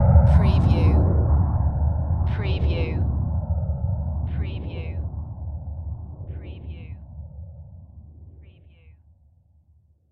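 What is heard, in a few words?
Production Element Hit Dark; Sound Effects Bible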